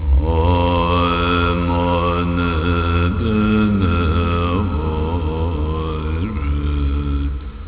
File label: OM mani padme hum